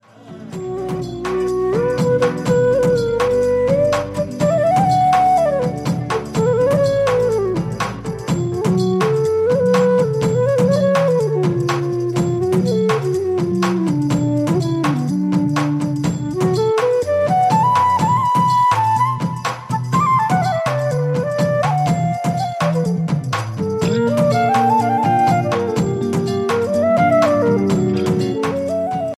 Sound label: ringtone